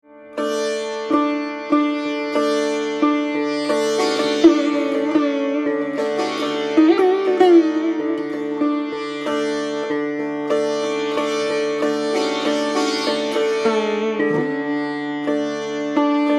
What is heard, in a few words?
sitar